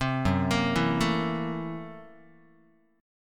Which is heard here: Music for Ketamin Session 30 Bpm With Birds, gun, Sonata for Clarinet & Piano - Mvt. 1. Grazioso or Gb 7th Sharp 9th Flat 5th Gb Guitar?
Gb 7th Sharp 9th Flat 5th Gb Guitar